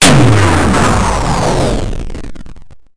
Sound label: rose beam; This is No Rose Garden